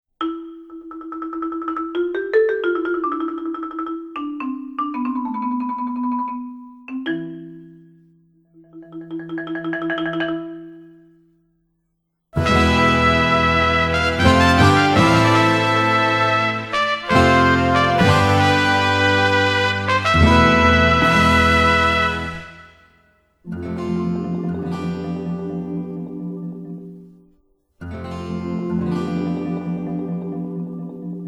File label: orch